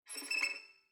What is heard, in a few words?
Violin Shivering Tremolo Short